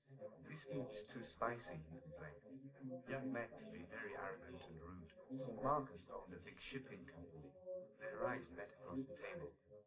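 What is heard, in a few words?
male, live recording 250ms 1m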